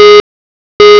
call wait; Asus P565

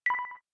Melody

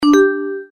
buddy joined, Sony Ericsson; Z310i

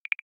circlemosaic touchdown; LG K10 T-Mobile

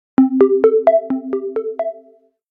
Calendar alarm, Nokia alert tones; Asha 301 Southeast Asia

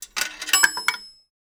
Coin Drop

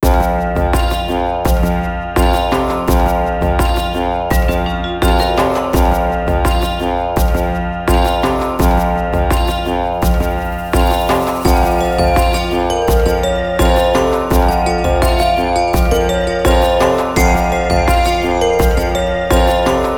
Alarm, phonetones; Sony Ericsson; PlusFourSix; Lars Edvall; Heptacle Alarm

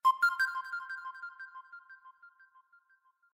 Panpipe, notifications; ACE Clever-1

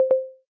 voice no input; Samsung Galaxy S10

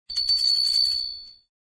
Wind tone

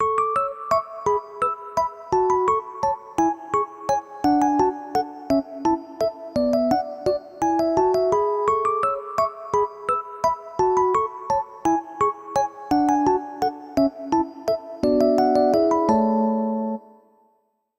Melody Bell

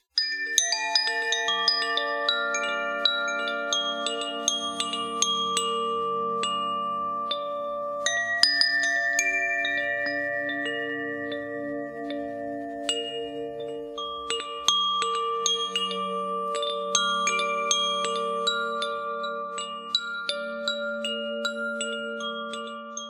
ringtone koshi; Mudita Pure

